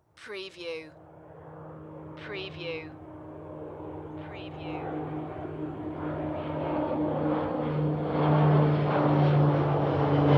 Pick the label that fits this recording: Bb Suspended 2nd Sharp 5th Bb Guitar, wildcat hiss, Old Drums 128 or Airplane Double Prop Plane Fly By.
Airplane Double Prop Plane Fly By